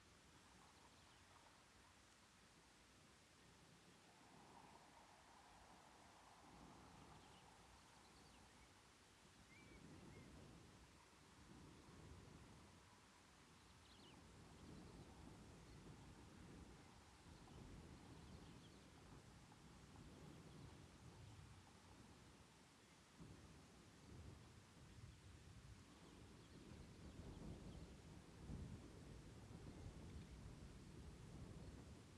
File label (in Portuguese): Ambiencia em Estrada de Terra da Pousada Inacia com Sons de Passaro